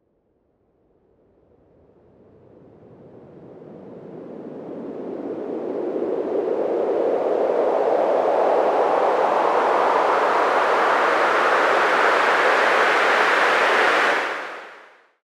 Medium Sweep, White Noise

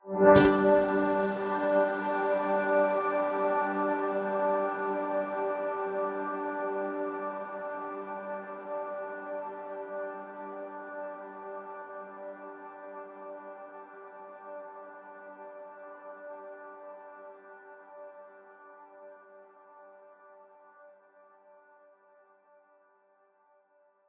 Stringy G, Simply Space

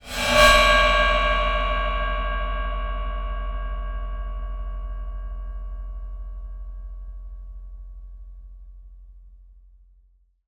Bowed Cymbal, Highs Mids Lows; Soundopolis; Fienup

